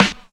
Nineties Rap Snare Drum Sample G Key, steel snare drum sound